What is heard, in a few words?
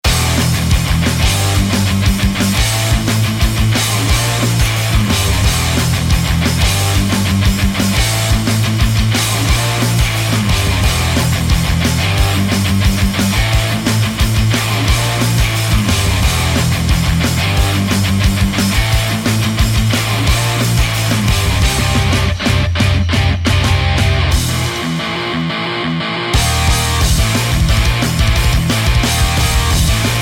neorock loop; svencoop; uboa rampage ii